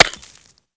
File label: pick ore